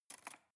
cutlery cake fork drawer place soft

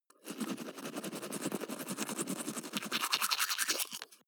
teeth brush dry closed front fast

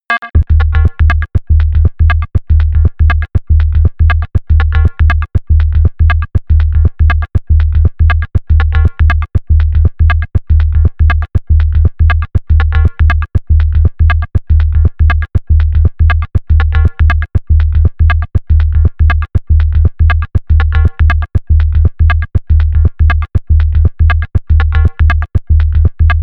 level music

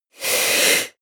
female breath medium in

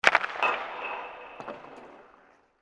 puck hits sideboard

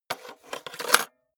cassette into cassette player

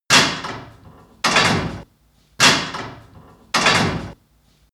industry machine mechanic metal complex

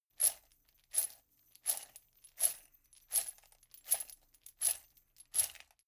chain mail armor movement sequence

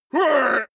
male shout hit soft

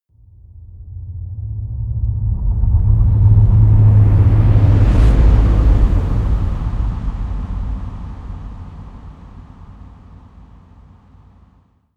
flutter rolling thunder

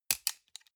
sunglasses plastic grab hard

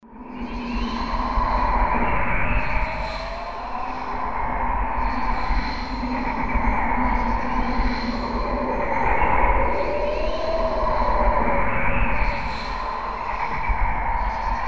space winds

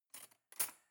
cutlery cake fork drawer place

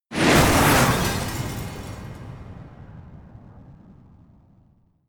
missile glass

